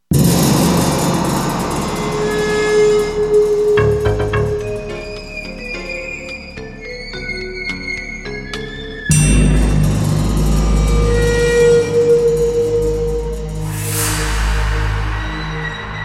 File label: elevator; eyespy